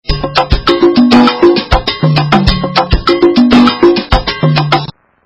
afro cuban; ringtones

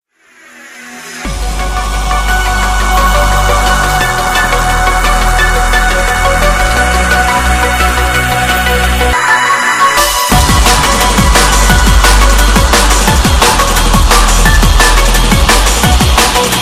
Digital Melody

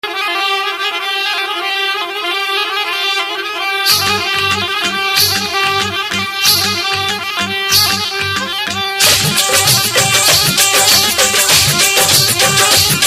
jazz

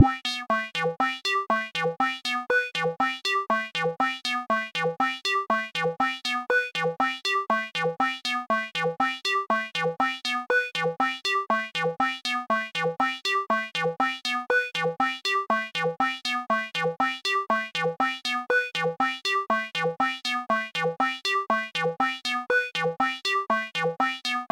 Synth

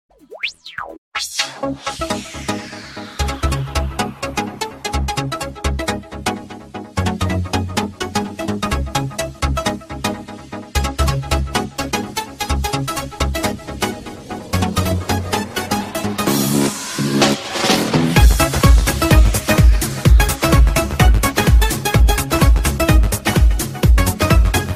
Deejay sound

